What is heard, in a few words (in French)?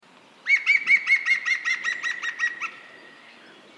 pic vert